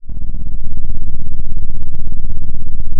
pop, Sound of an engine revving

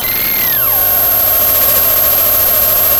Sound of a cat meowing

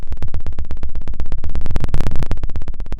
pop, Sound of a dinosaur

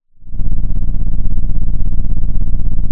Sound of an engine revving

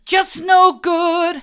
just no good hard, vocal

